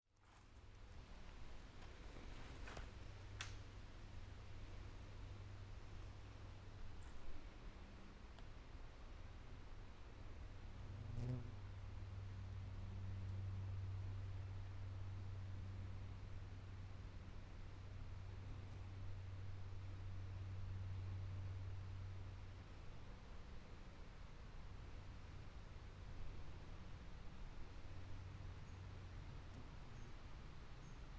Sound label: random walk, soundscapes; orford